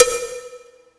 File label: 80s Drum Rim; Yamaha PSR E305